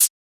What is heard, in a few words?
Shaker; FLPRO